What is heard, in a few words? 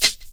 PERC, Dance Mega Drums; Best Service; PERC MIX